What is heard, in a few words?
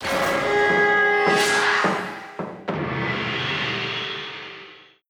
Game Start; MonsterHunter